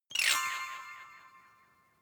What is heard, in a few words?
notification glockenspiel sparkle slide down hard